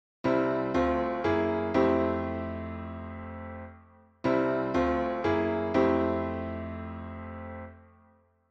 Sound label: I - bV - IV, gospel piano; Par au dessus : I-(bV)-IV; Gb7 correspond à la substitution tritonique de C7 ( Dominante Secondaire ); Enchainements d'Accords